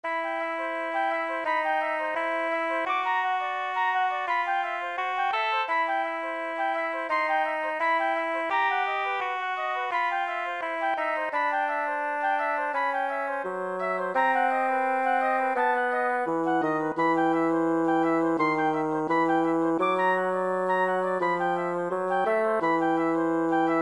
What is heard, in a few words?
for basson and flute presto; 4 minatures